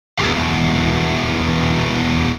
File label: chord